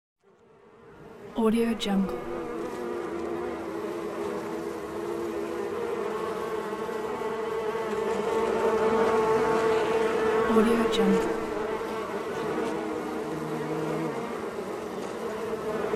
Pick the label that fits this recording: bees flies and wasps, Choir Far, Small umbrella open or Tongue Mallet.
bees flies and wasps